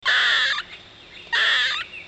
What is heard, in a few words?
Baby Gray Squirrel